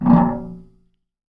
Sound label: Radiator, contact mic, Metalics